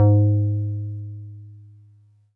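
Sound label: Wood Piano DX100 G#1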